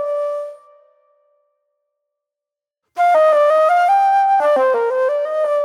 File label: Flute Noir; Sonic Foundry (Sony Creative Software); Manhattan Flutes